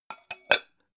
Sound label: pan close hard